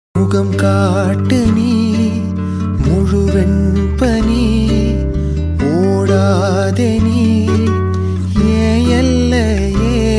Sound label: feeling songs feeling bgm